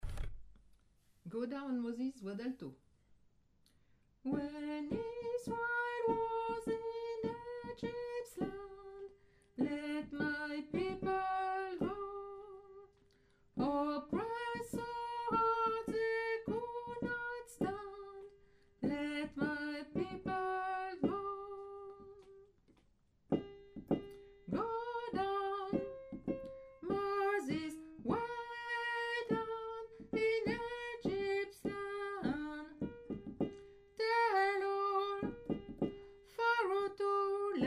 go down moses alto; choeur

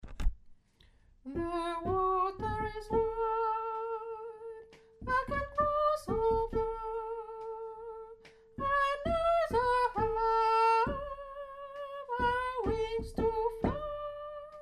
tenor, chorale; the water is wide

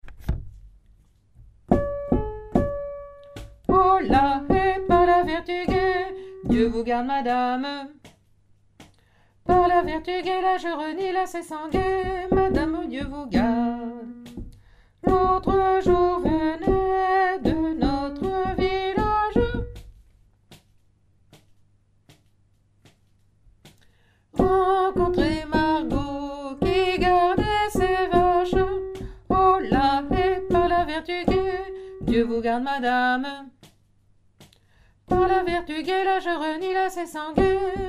hola he alto

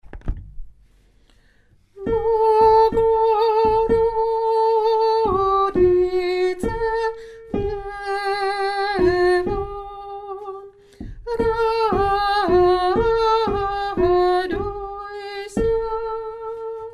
bogoroditse devo soprano

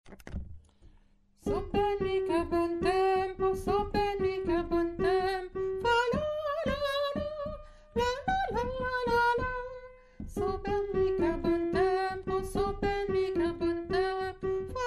so ben mi ch'a bon tempo tenor

